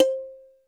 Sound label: kalimba, tonal percs; Crypton - DrumBase5000